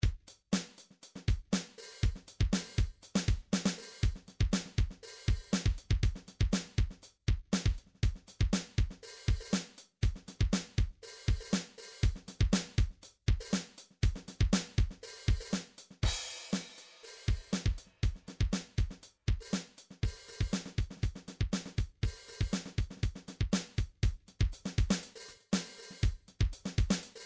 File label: midi drums percussion; Midi Vol 4